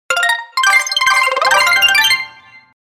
Medal Awarded Jingle; Metroid Prime Federation Force

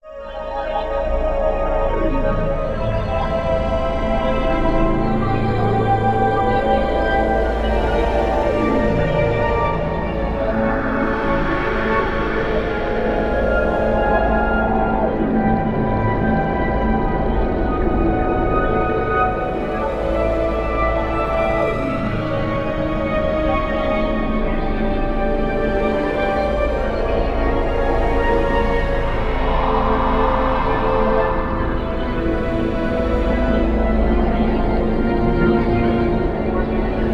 Drained Caves Ambience; Drained Caves 1; Metroid Samus Returns

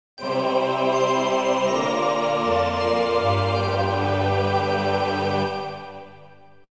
Obtainment Jingle; Octolith; Metroid Prime Hunters

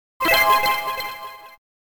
Key Appearance Jingle; Metroid Prime Hunters

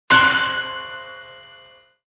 Key Collected Jingle; Metroid Prime Federation Force

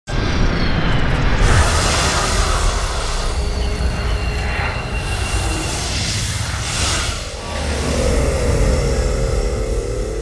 Game Over Hyper Mode; Metroid Prime 3

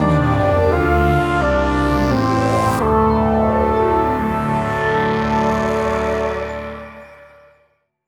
Jingle; Metroid Dread

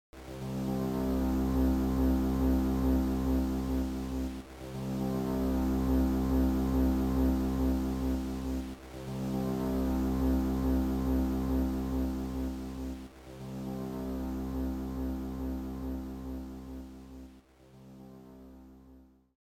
Game Over; Metroid Zero Mission

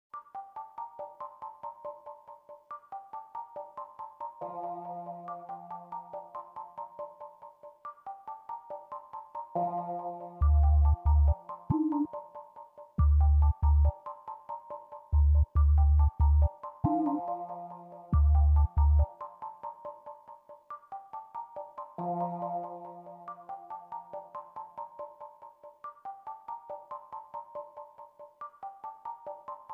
Environment Sound Intrigue BGM Version; Metroid Other M

